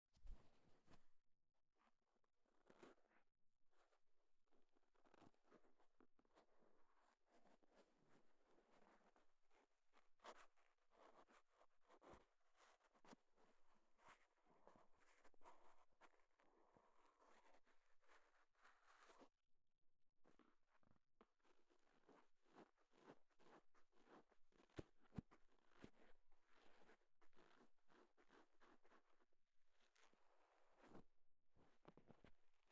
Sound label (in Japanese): 綿棒 外, 耳かきに関する音; KU100